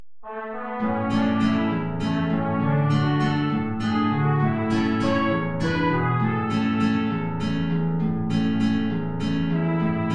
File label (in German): Trompete in Bb & Gitarre, A-Dur, tief